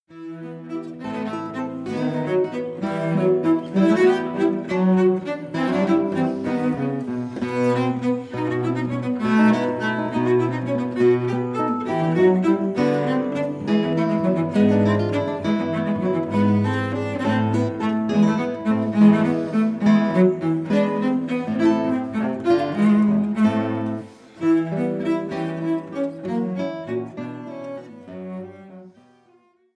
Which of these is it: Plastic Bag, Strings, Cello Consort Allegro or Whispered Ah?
Cello Consort Allegro